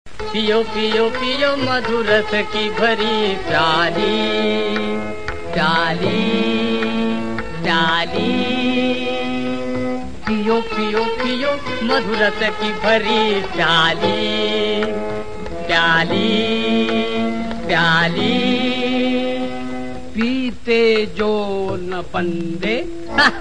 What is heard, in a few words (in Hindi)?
purushh duo